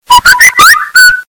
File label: loud wistle